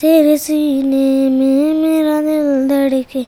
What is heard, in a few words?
CHILD, Indian Traditions